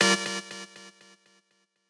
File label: stab